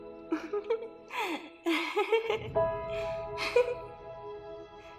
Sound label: sad laugh; Harley